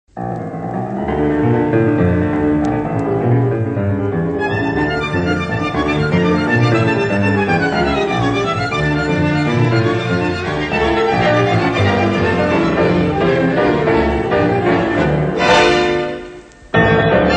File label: bass olloron